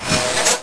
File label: Disclose; spiderweb